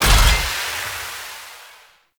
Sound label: Cargo Train Coupler Detach Start; Warframe; Corpus; Railjack